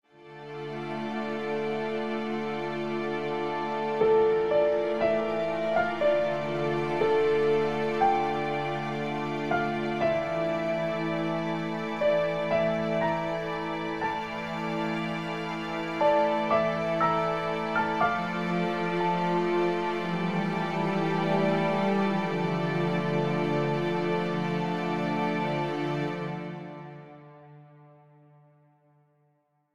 Melancholic Outro

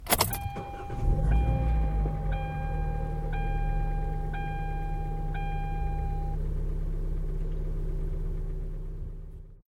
Car Starting

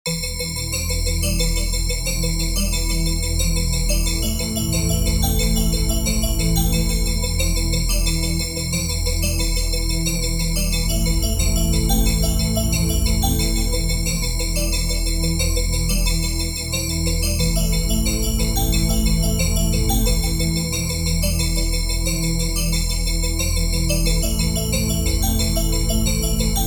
Horror Bells

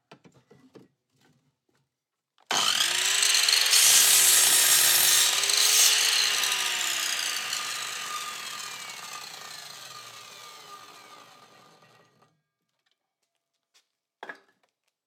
Circular Saw

